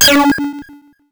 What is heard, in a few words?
randomize, 8 bits